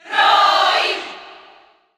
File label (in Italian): Cheer Italian; Roy; SSB4; SSBU